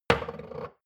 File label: shot glass place table hard wobble; soundpool